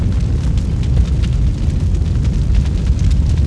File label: Orc Human Large Building Fire; war3source